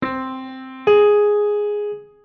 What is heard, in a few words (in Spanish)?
sexta menor ascendente; sextas